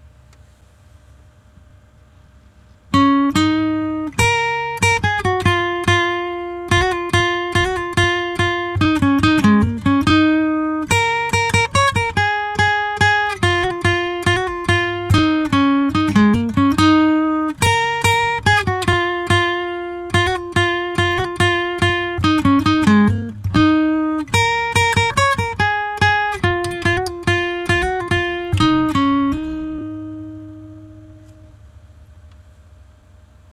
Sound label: Unknown Folk